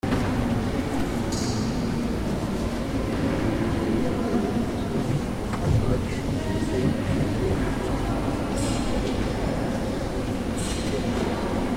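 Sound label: atmo germany berlin cathedral interior; berliner dom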